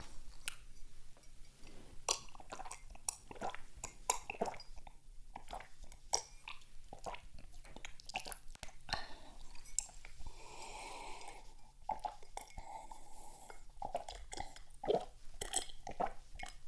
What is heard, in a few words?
Drinking ASMR Binural Loopable